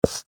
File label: Office Dry Erase Board Write Single Stroke; Eraser Sound Effects; Erasers (Sound Effects:Offices); SFX Bible 6